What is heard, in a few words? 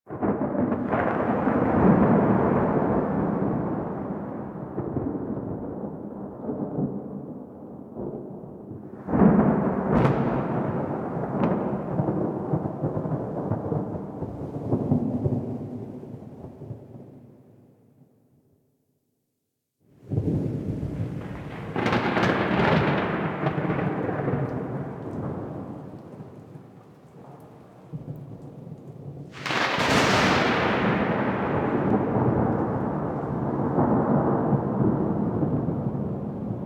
Thunder; Warframe; The New War; Ballas Lotus Operator Intro